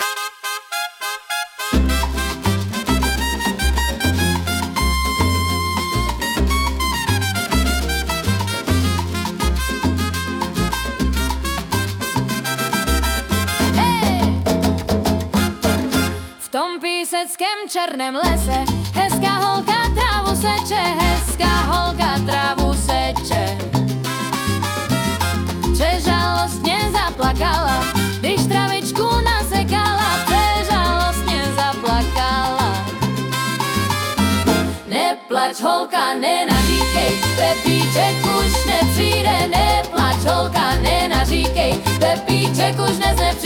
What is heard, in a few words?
son salsa